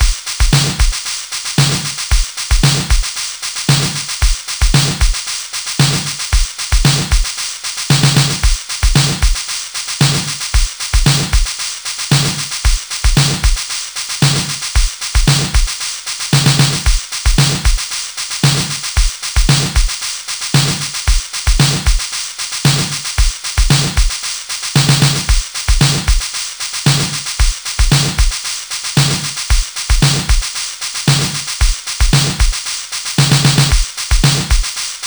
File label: dance rythm (repeat); Sonix Music Driver